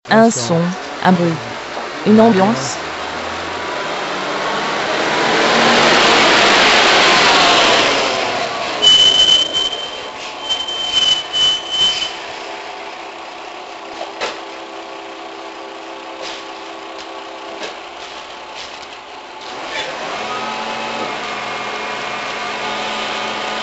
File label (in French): Un son un bruit une ambiance